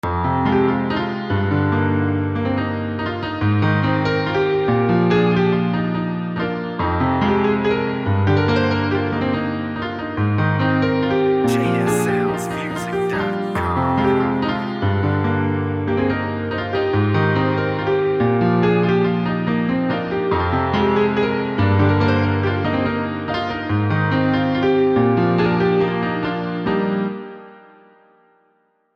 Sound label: Piano Vibe